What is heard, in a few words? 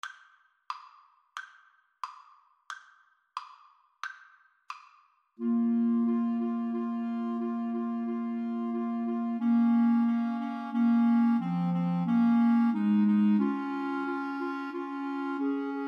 pavane, Clarinet Trio